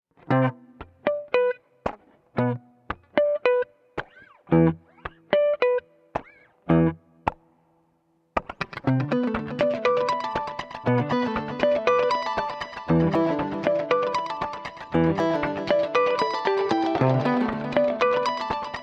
Minor Pentatonic delay groove; Hedra

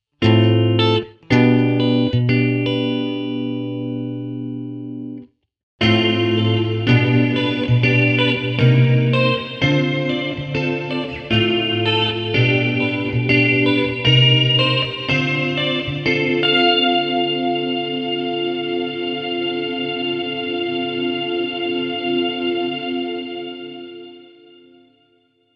MultiVoice Chorus

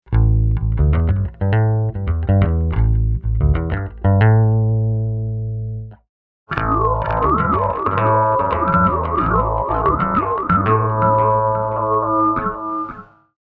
Vocal Bass

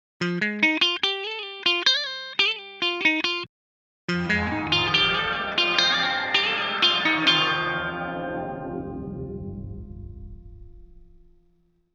Sub Octave Verb

